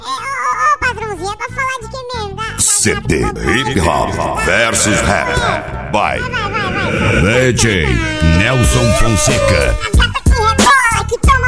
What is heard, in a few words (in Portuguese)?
hip hop vs rap